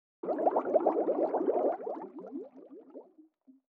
Underwater Water Splash Gurgle Bubbles Air Valve Movement SCUBA Close Perspective